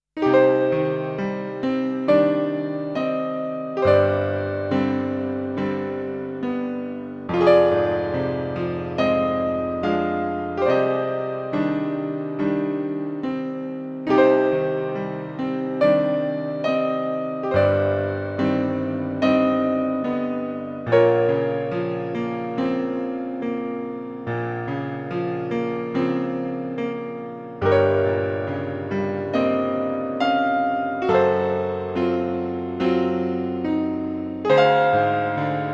Piano Solo

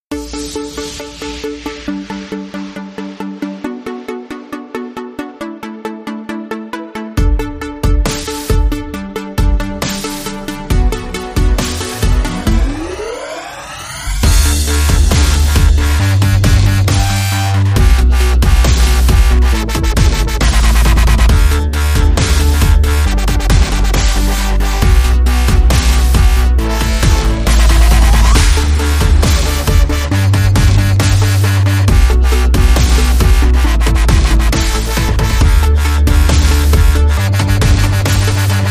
No Theme Less Synths No Drumloop Intro

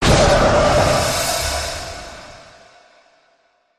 Hit FX Impale

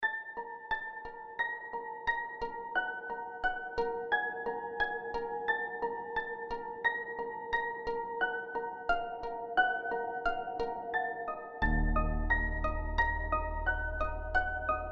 Piano Riff

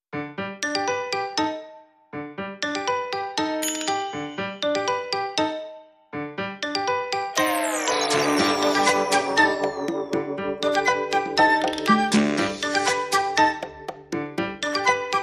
No Drums No Bass

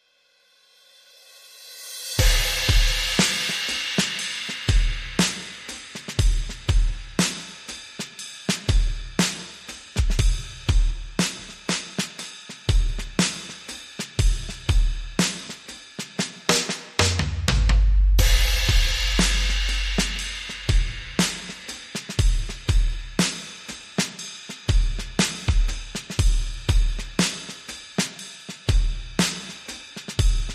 Clean Drums Mix

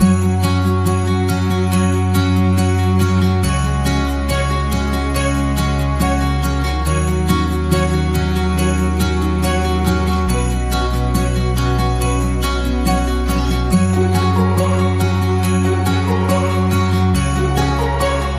Joyful Acoustic Christmas Soft Loop

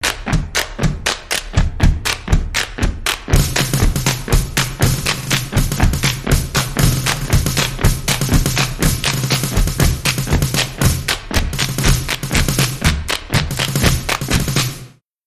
Military Stomp

